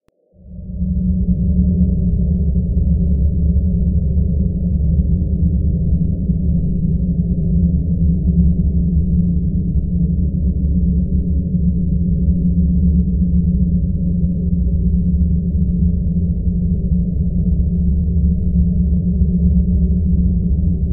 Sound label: Cave Wind